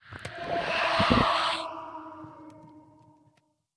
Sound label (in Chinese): 不祥试炼刷怪笼环境声; ambient